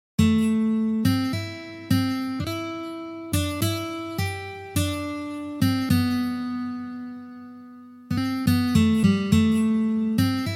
na gitare

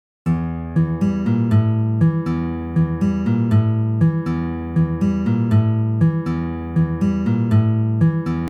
vstuplenie

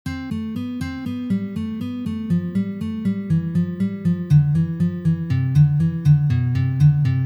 Uprazhnenie dlya igry gammy Lya minor

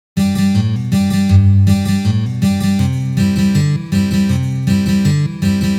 Boj Kantri na gitare

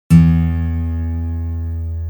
SHestaya struna gitary

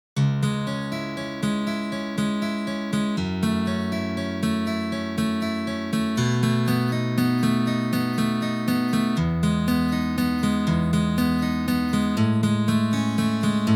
Melodiya iz Stalkera na gitare, gitare

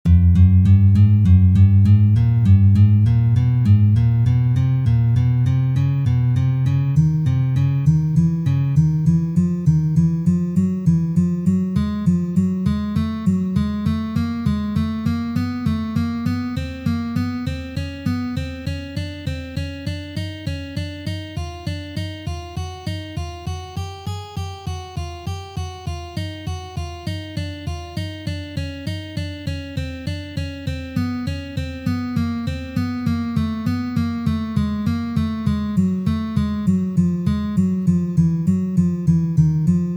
Uprazhneniya dlya gitary so smeshheniem

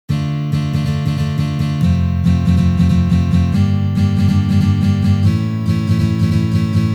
boj

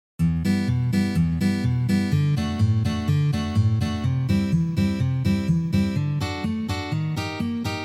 boj

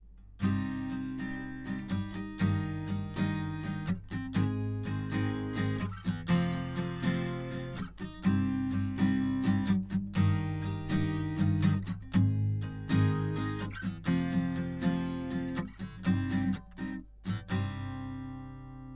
Akkord Cm uprazhnenie

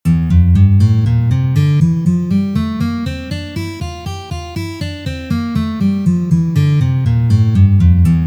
Gamma Re minor naturalnyj vid 2 pozitsiya

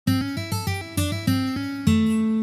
Blyuzovye liki i uprazhneniya

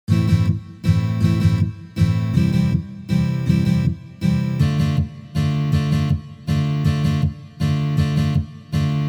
Valsovyj boj na gitare

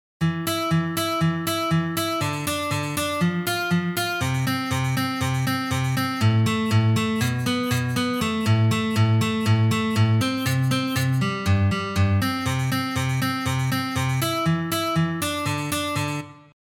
Uprazhnenie dlya igry oktavami

